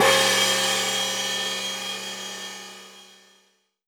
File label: crash